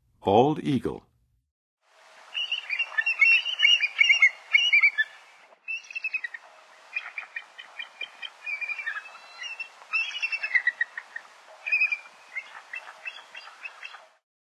Bald Eagle, Western USA Songbirds; North America

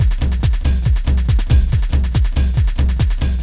techno 140

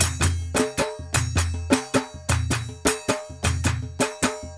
Percussion, Indian

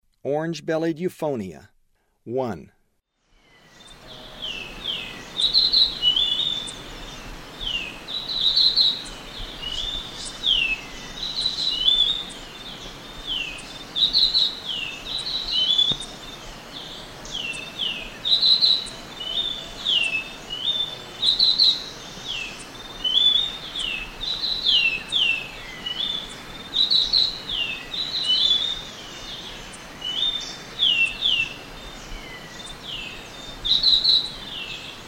Orange-bellied Euphonia; S. America; Eastern Lowlands

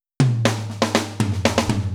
DISCRETE DRUMS

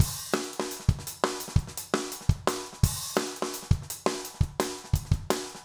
jungle

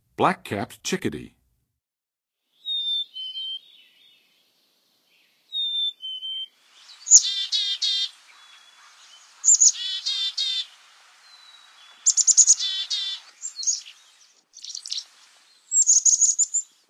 Black-capped Chickadee, Songs of Birds; North America; Western USA; Western USA Songbirds

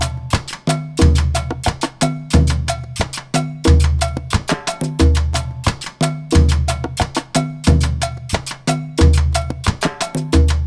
Perc, Percussion Latin

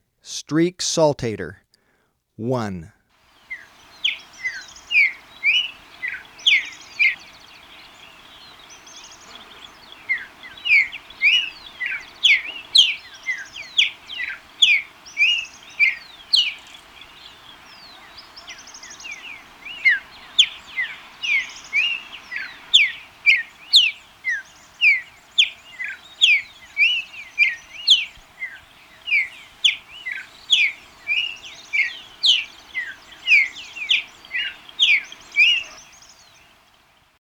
Streaked Saltator, Birds of Ecuador; S. America

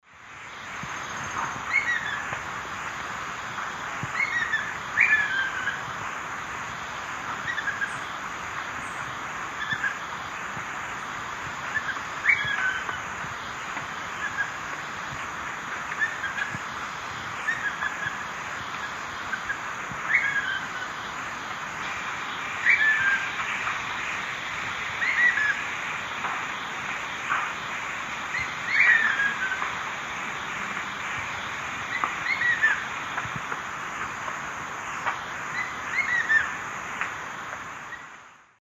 Attila spadiceus, calls 2 birds; S. America; Eastern Lowlands

